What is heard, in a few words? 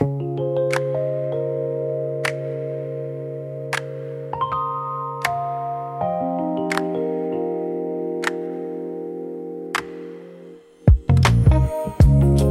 a calm chillout flow; ocean echo chill